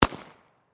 Gun Handgun, Gun, Handgun, 9Mm, Single, Distant, 100, Feet, Towards, Springfield, Xd9, Pistol; Warfare